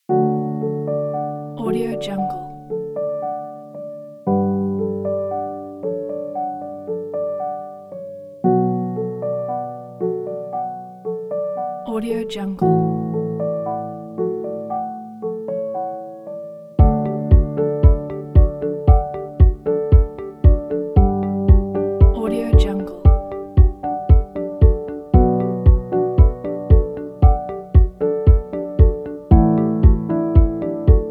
minimal piano

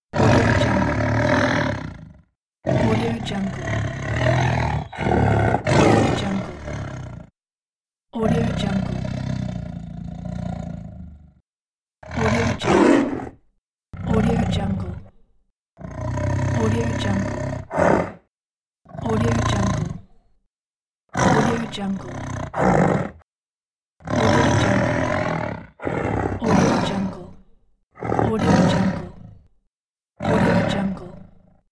tiger growls; musicstudioelixirium

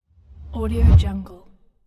fast woosh

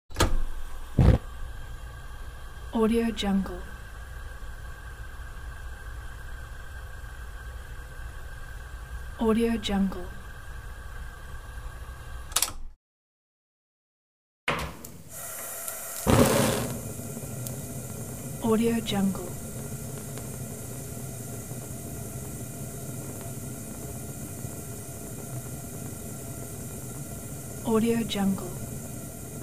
set fire to a gas stove

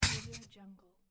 scifi biomechanical shotgun shot

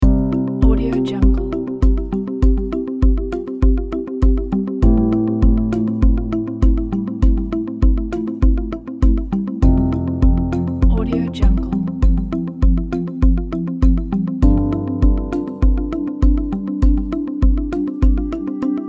ambient corporation

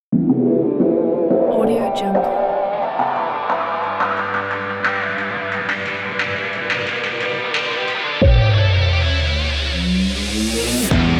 action rock

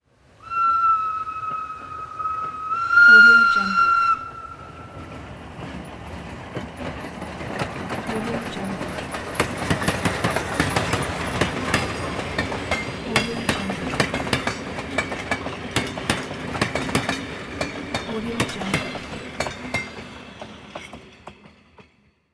steam locomotive train whistle and pass by

